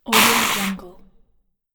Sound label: small monster voice effect